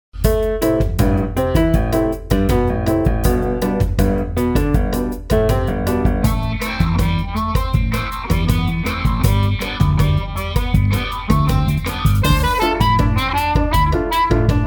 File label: maj7 samba, harmonica